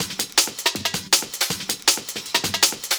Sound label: Beat, Drum N Bass; Sonic Foundry (Sony Creative Software); Knutrix Drum & Bass